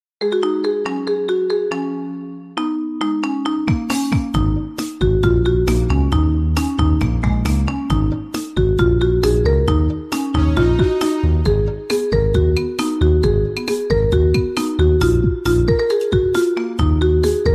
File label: toothless dancing marimba remix